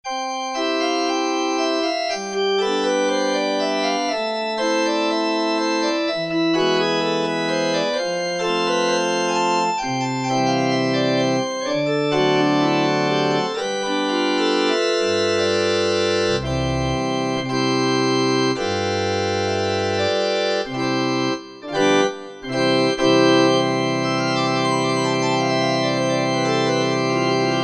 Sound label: Pipe Organ, Korg MSC-9S Organ